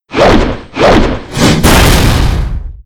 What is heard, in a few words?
Angry Yeti Skill; Seer; GamigoNA